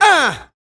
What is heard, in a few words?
jamesuhh, Vox